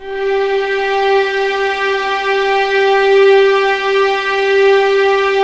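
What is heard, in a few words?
G4 legato mf violins, left; East West; The Ultimate String Collection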